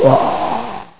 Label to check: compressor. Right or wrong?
Wrong